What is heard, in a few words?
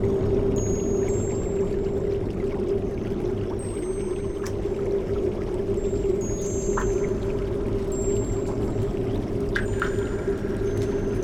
Ice Cavern Loop; Sample Audio Pack; Free Pack